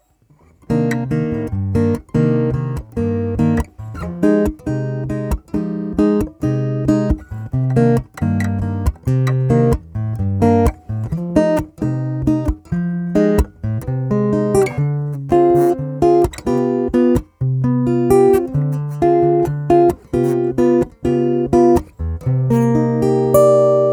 Cole Clark Fat Lady 2 fingerpicking piezo25 face75 mic70